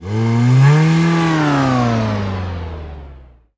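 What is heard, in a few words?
car; autko; Mafie 1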